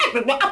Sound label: scratch beatbox; turtlestitch